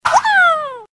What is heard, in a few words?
Throw; Pikmin